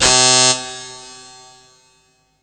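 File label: synth tone; SoundFactory; SMPMANIA; EFFECTS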